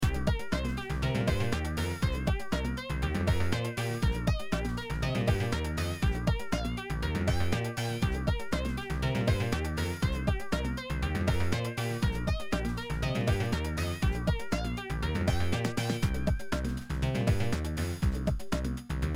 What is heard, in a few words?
bass basstone lead sound beat